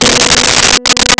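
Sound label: Nylon String Guitar B4; Nintendo; July 2020 Gigaleak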